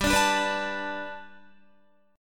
G Major Add 9th, Guitar; Gadd9 strummed

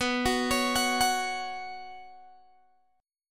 B Suspended 2nd, Guitar, Open G

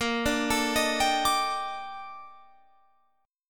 A sharp Major 13th A sharp Guitar Open F; arpeggiated

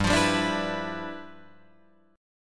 G 13th G Guitar Drop D, chord

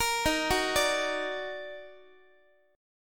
D sharp Minor Major 7th D sharp down Guitar; arpeggiated

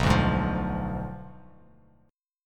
B Diminished 7th B down Bass Standard; Bdim7 strummed

